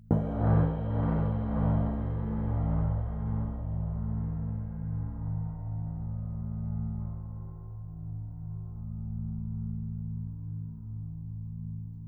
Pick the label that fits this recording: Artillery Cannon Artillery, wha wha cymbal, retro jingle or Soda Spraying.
wha wha cymbal